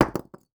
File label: masonry hit; teardown ps5 windows xbox series xs gamerip 2022